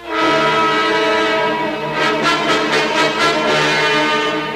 bold horror fanfare

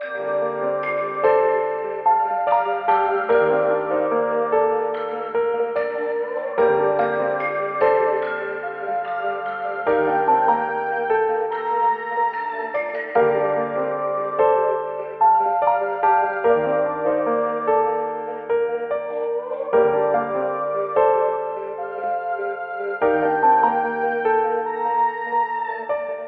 keys and piano, sad loops

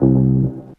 sterno, Bass

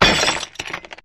glass shatter

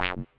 dist, Synths; Notes

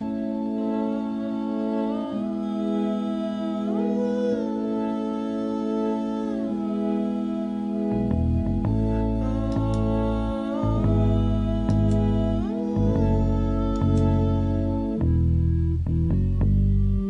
keys, jazz loops

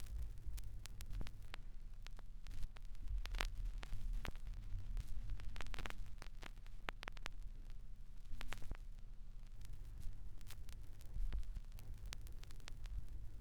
crackles, Vinyl

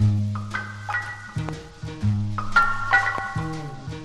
piano, Phonky loops

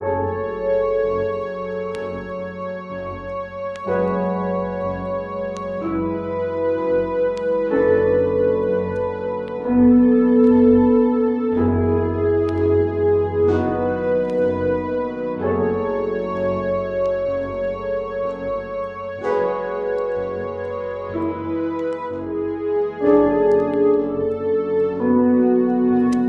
keys, Sad Loops

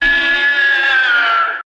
arrgh, Voice; ooh ahh

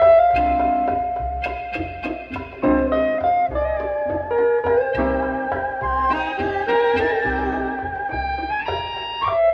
guitar and keys spanish ghosts; Loops; Phonky

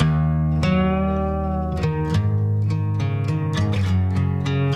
guitar, Loops, Sad

